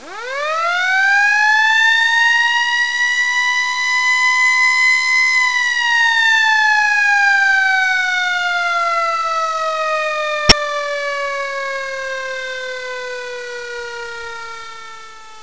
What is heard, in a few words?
fire alarm